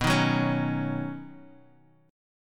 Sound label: B Major Add 9th B, chord; Badd9; strummed; JGuitar